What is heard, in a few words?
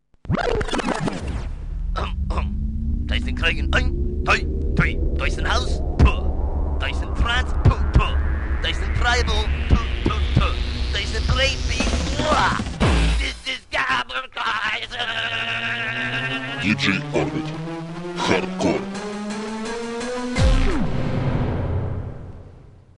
BEAT, Джинглы